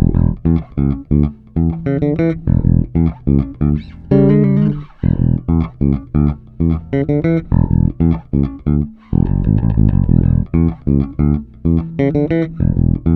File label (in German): klangbeispiel 2 finger